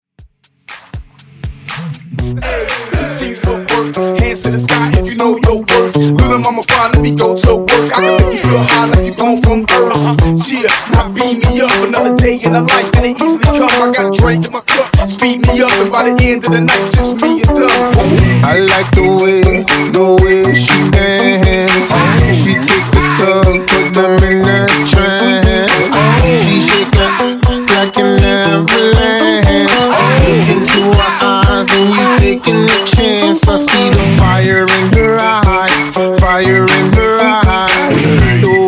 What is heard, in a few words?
Party Break Mixshow Hype Intro Outro 120 BPM